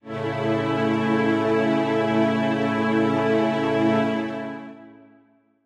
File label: Strings end